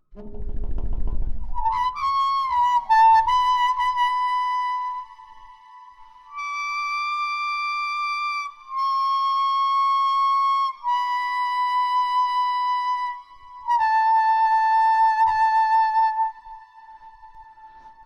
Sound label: Reed; Schluessig